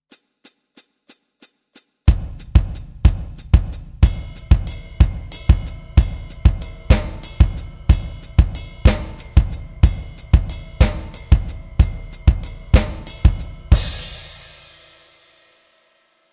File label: Pulsation